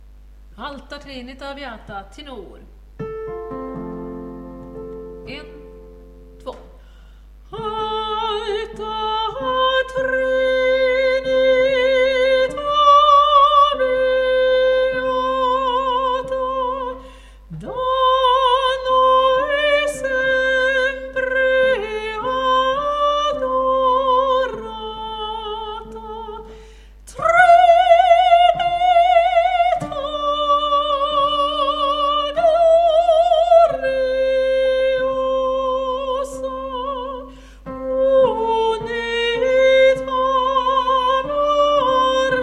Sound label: tenor